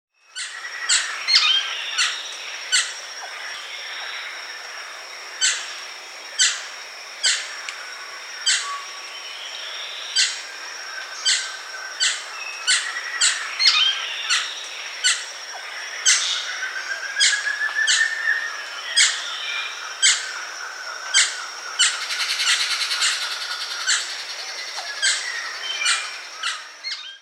McConnell's Flycatcher, Mionectes, S. America

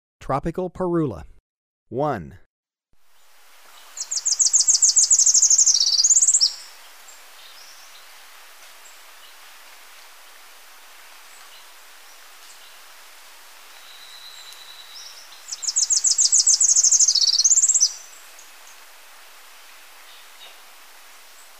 Tropical Parula, Birds of Ecuador; S. America

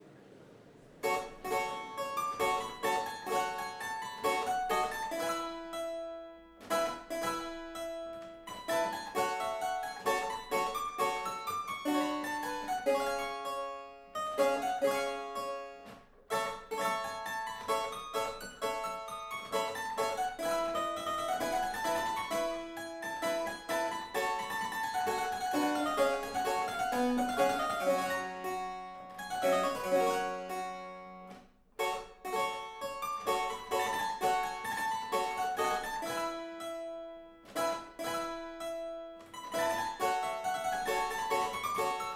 Italian virginal music; Anon

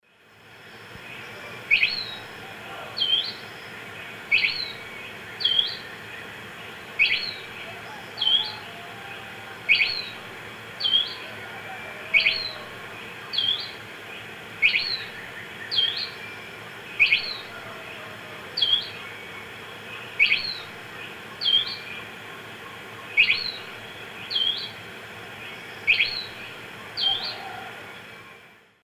Sublegatus obscurior, dawn song; S. America; Eastern Lowlands

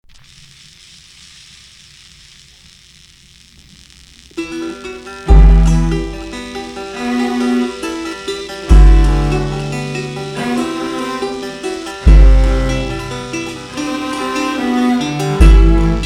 banjo song; Neo-Folk

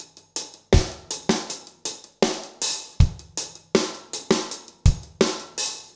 reggae

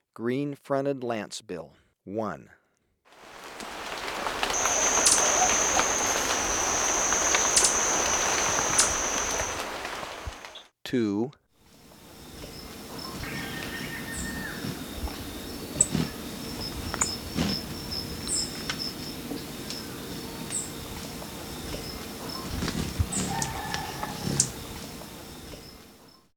Green-Fronted Lancebill, Birds of Ecuador; S. America

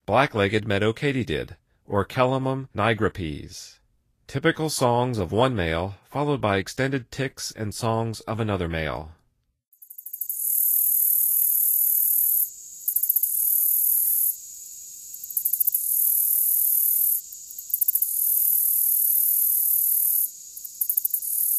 Black-legged Meadow Katydid; N. America

